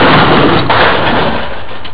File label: Car